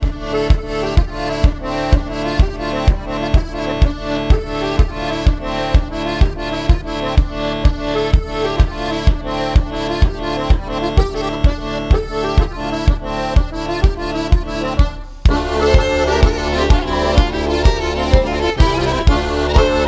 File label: Highland dance